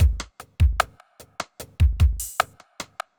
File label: evil beats